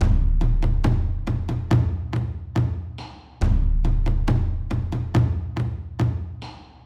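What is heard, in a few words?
drum loop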